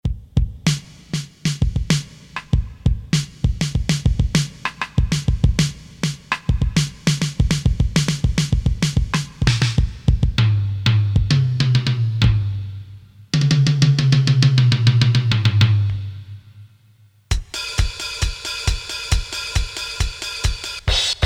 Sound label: Drum